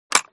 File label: sv98 pull, guns